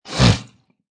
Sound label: Nose Snort, Quick human male snort; Snorting